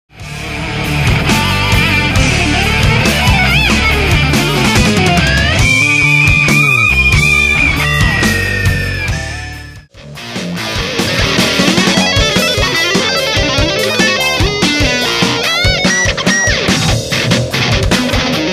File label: guitar sampler